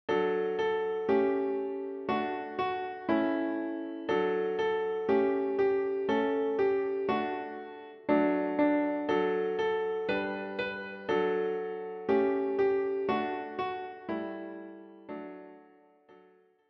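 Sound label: piano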